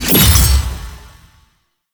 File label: Shield Armor Ability Impact; Warframe; Warframes; Helminth Abilities